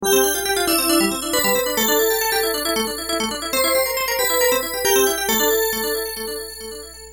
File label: Neo Geo Startup